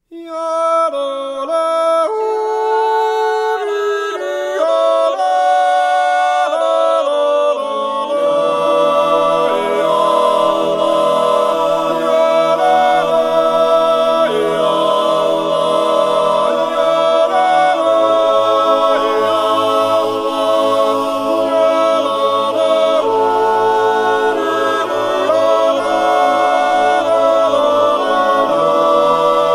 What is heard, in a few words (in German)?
Naturjodel